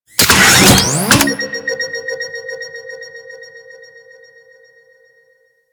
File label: Collar Activate; Warframe; Alad V; Abilities